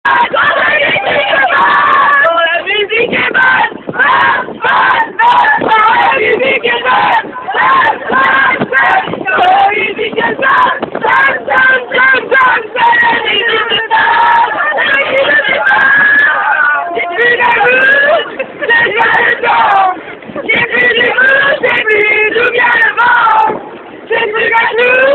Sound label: chorus mob